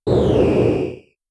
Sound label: jet